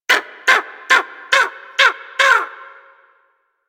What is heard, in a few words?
Bird Of Prey Vocal Idle Beg; Warframe